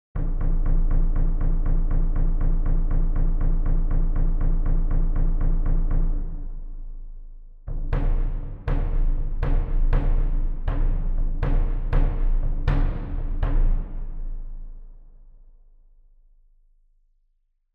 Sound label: percussions velocity